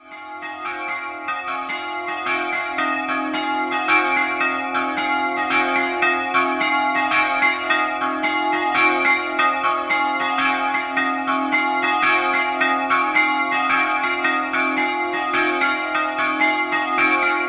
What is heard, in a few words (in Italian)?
San Martino al Tagliamento, campane; San Giacomo